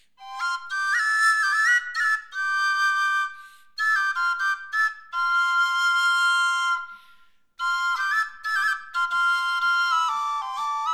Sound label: Saltarello